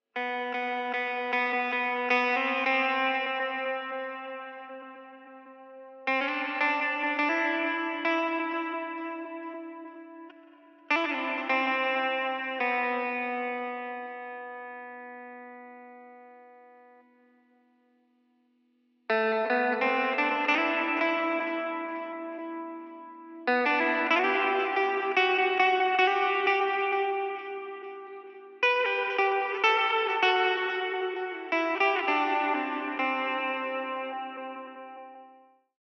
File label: Guitar solo; raji an ancient epic